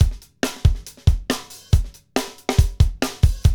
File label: FUNK; Northstar - Drumscapes Roland